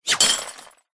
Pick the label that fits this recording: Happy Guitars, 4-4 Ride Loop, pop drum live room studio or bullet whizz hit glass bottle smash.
bullet whizz hit glass bottle smash